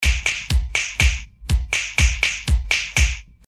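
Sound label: retro clicks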